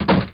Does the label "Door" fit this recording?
Yes